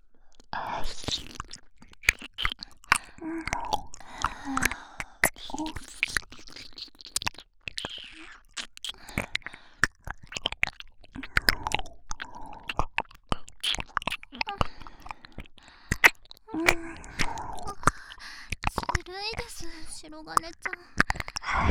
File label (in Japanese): 二人で耳舐め、乳首舐め